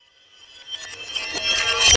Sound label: reverse glass smash